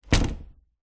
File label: household door internal wood close